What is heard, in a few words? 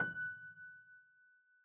piano sampler